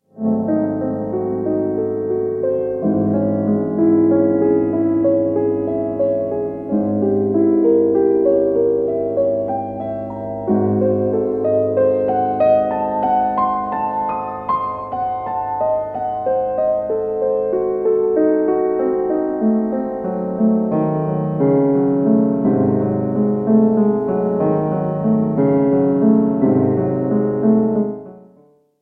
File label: 1st movement adagio sostenuto from piano sonata no 14 in C sharp minor; ludwig van beethoven; op 27 2